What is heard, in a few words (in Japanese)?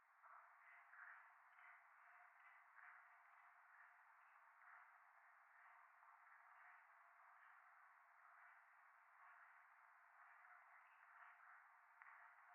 夢の中 フェラチオ 雨